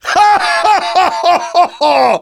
Demoman laugh happy

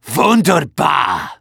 positive vocalization; Medic

